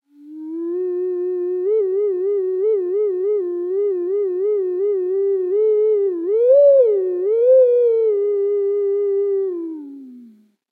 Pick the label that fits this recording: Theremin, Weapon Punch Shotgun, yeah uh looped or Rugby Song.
Theremin